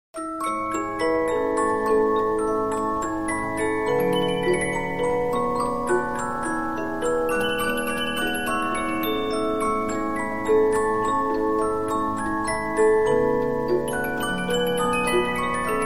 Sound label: Polyphon, 15inch disc musicbox